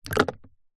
ice cube drop into water in plastic tub; food drink

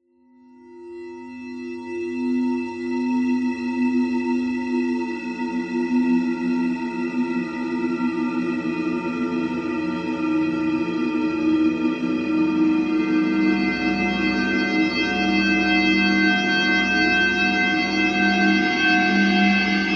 horror swell long ghostly discordant; zapsplat

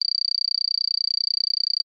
game tone toggle scroll

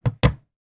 household wooden door cabinet close

